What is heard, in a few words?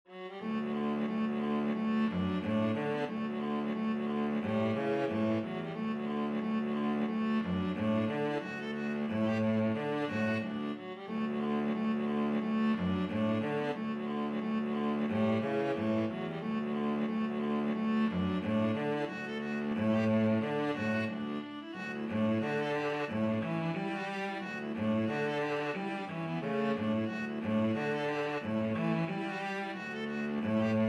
viola cello duet; soldiers joy